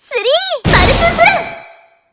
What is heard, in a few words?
suppercut; celica